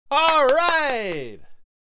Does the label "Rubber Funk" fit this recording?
No